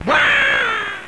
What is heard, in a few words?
die; Games.Rick Dangerous